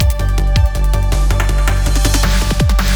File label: Snow Transition; sky rogue